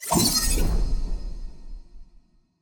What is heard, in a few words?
UI Event; Irelia Portrait; bloom minigame